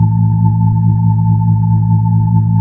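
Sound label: B-3, Brass Chorus; Br. Ch. Xfade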